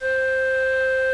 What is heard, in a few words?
three-holed, instruments various; Sound Effects for Multimedia - Volume 1